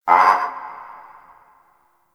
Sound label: Forest Creature Mid Far, Ambience; Warframe; Grineer